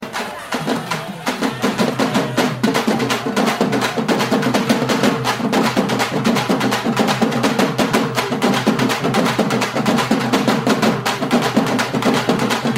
Beat; Paruthiveeran

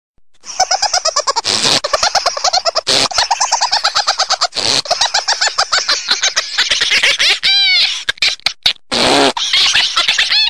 Cat

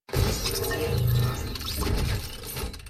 spike trap

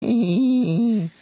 EeeEeE

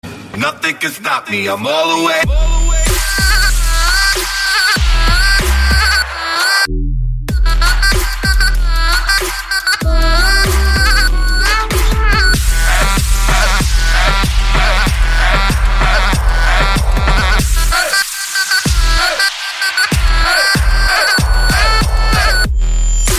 beat

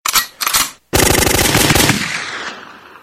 Gun Assualt

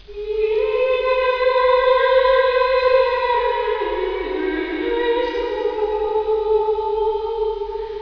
Gothic Sms

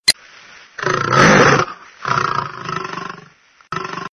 Lion Msg

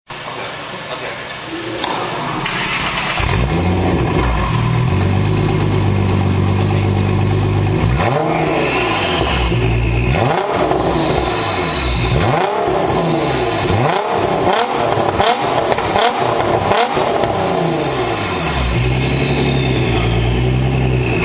R35 Gtr Greddy

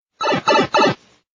Warp Pipe Sms; Mario

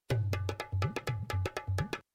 Dholak

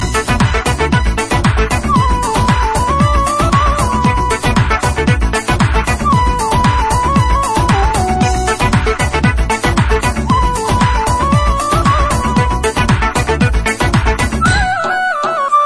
Music

